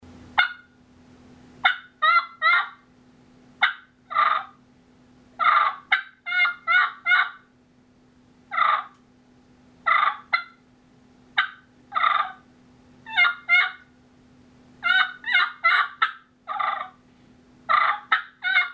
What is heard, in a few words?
Soft Yelp And Cluck And Purr Sequence, turkey